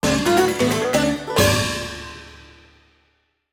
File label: bgm kitchen jingle; monster hunter 4 ultimate